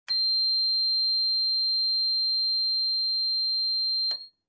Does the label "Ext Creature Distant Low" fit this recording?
No